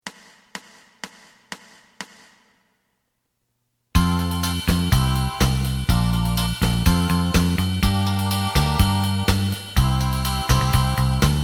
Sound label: lead